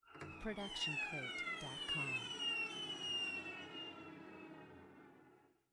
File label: Cello Seagull Effect; SoundsCrate